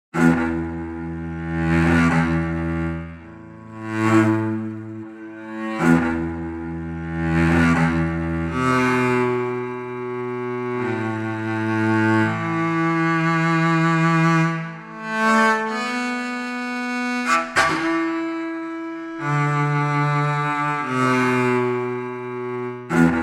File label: The Man Who Played One Chord